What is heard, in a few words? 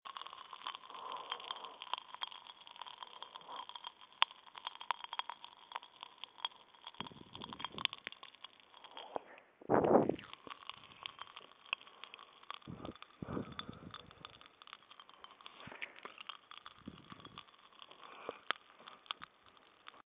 popping candy